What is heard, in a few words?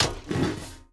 Stone Turn